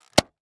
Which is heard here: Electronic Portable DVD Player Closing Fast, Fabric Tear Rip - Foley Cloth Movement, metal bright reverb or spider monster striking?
Electronic Portable DVD Player Closing Fast